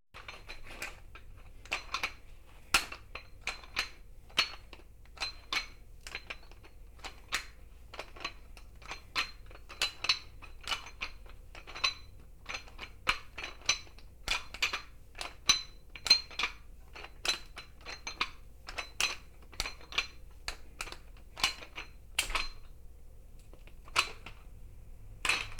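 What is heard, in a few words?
Weight Lifting; KMP Film Video Sound Effects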